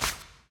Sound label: Player Paddle Attack Layer Snow Heavy